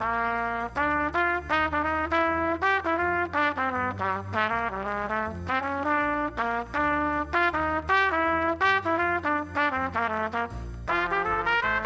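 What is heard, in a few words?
Old rag trumpets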